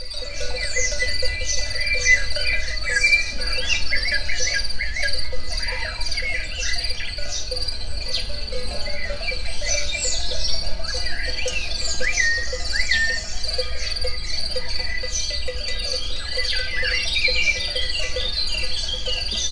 kolomp madarak rovarok, juh; Egerszög

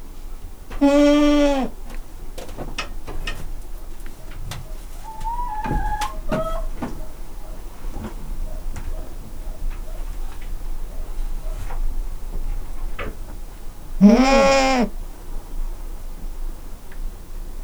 kecske tyúk; állathangok; paraszt udvar; házi kecske

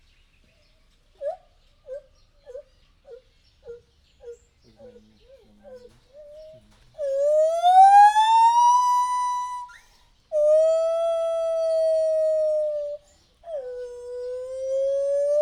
egy rövidének, fehérkezű gibbon; jaszberenyi zoo 2015

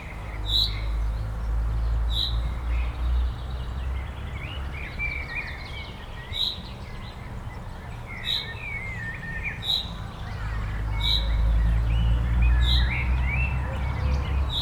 a főútvonal közelében, tücsökmadár; jaszberenyi zoo 2016

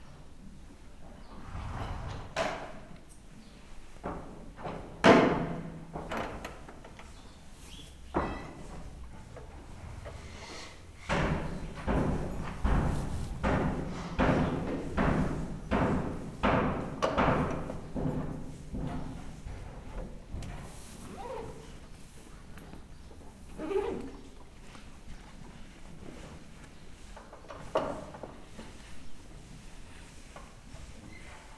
mellékeszajok nyerítések szuszogások, ló; Hortobágyi Nemzeti Park 2013; máta

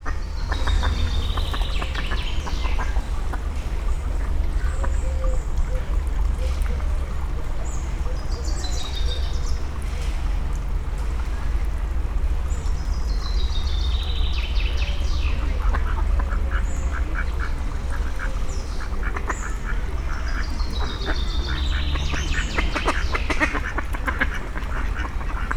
úszkálnak, tőkés réce; szegedi zoo 2011

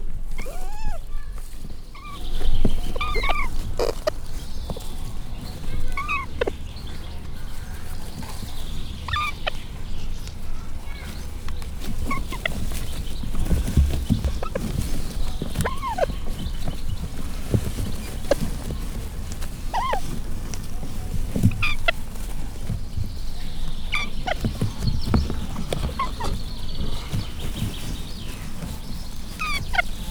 biztonságra utaló hang egyhónapos fiókák, nagy sándorpapagáj; jaszberenyi zoo 2016

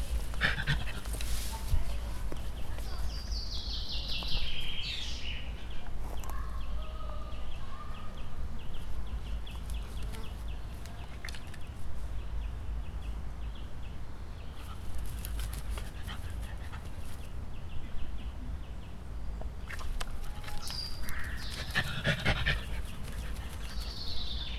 vágott felvétel, rozsomák; szegedi zoo 2011

